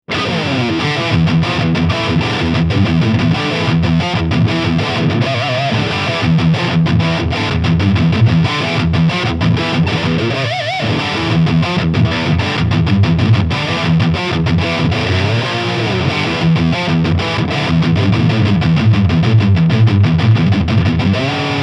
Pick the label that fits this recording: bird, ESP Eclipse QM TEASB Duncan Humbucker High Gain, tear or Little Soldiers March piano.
ESP Eclipse QM TEASB Duncan Humbucker High Gain